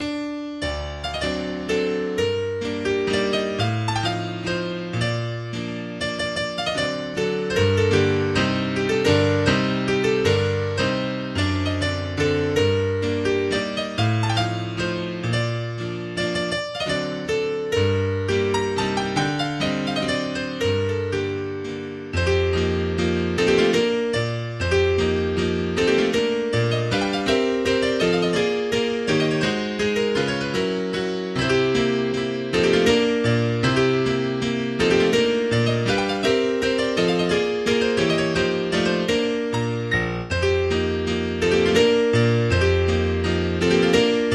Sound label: Mazurka No43 in G Minor Opus 67 No2 Cantabile